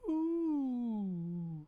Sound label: "Uuh"